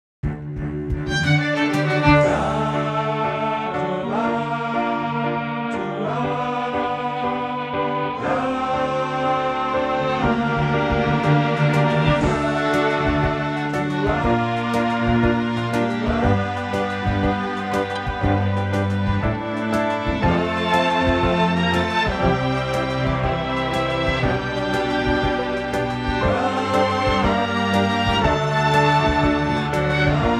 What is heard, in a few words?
Season Of Us, Classical Cinematic; Narrative Mind